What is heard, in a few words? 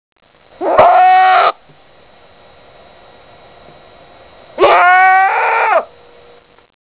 heat, goats